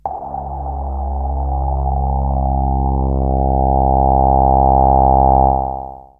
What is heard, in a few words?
Synth hits and riffs